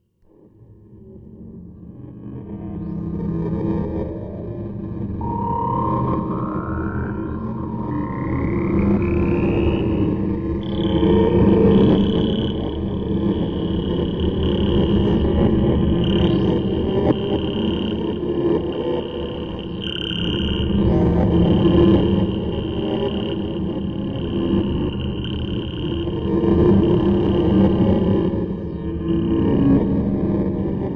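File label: Lost Horizon Slow Moving, eerie ambience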